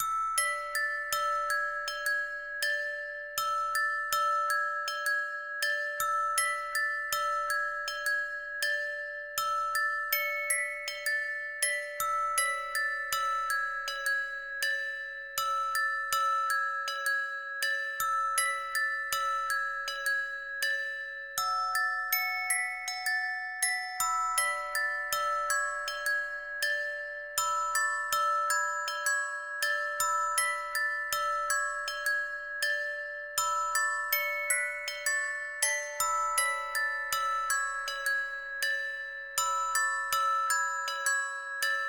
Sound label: music box; Undertale